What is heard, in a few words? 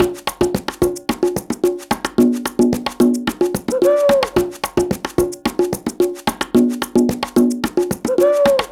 Beats